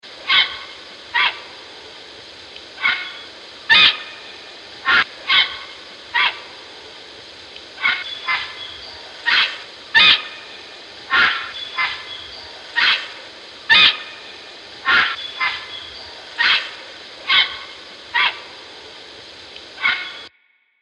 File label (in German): Graureiher, Tierstimmen